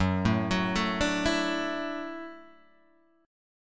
F sharp Minor 7th Sharp 5th, Guitar Open G; chordshape; down

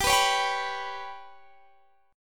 G Major Flat 5th, Guitar, Standard; strummed

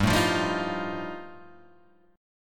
G 7th Suspended 2nd Sharp 5th G chord

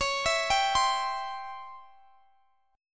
Db Minor Major 7th Flat 5th Db down; arpeggiated; DbmM7b5

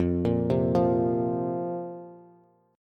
F Suspended 4th F, Bass; Fsus4 arpeggiated; 13,13,10,10; JGuitar

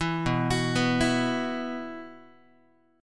B Suspended 4th, Guitar

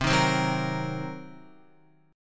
C Minor 7th Flat 5th, Guitar; strummed; chordshape

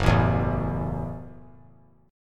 Gb Minor 7th; strummed; JGuitar

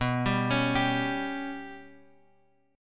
B Suspended 2nd Sharp 5th down Guitar Open G

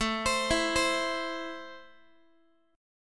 A Minor A down Guitar; JGuitar

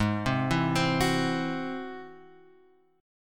G sharp Augmented 7th G sharp; G#+7 Chord {4 3 2 5 X 2} arpeggiated

